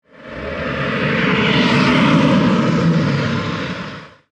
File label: Deep Slow Crunch; Deep Tones; BlastWave